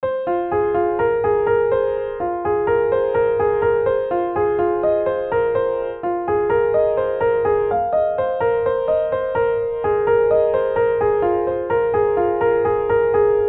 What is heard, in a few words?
(Piano Cover)